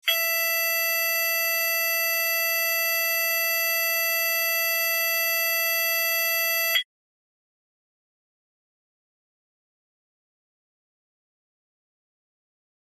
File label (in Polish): ton